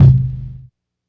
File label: tom one shot low; Percs